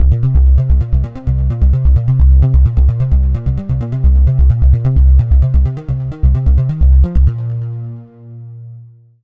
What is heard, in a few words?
Bass loop 130bpm B flat; Aura Ocea